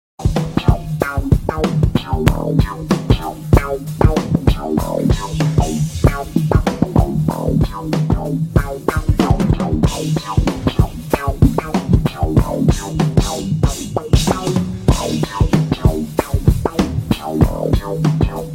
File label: wah wah slap bass; Jazz